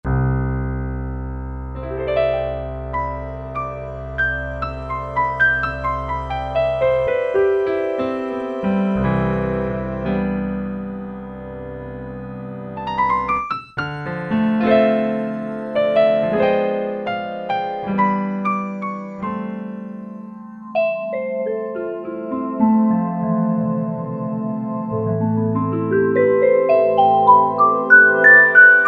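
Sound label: Real Piano Vibe, E-mu Morpheus; Factory Presets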